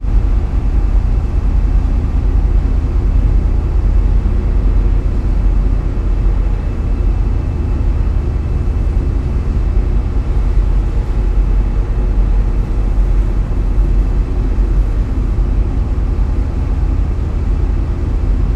transport boat ship ferry external ambience on top deck engine noise